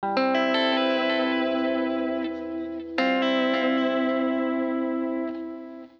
080 Chill Pop Guitar Arp Amin, Chill Electric Guitar; Pop Rock; Pro Tools Essential Loops; Digidesign